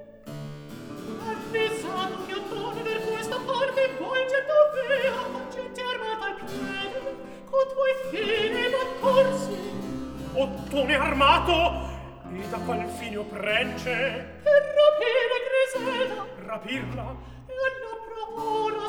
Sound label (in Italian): Recitativo; Atto II; Scene 11; (Corrado. Gualtiero, Costanza, Griselda); Avvisato che Otton ver questa parte